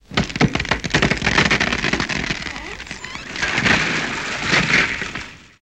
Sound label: Tree Cracks Falls; trees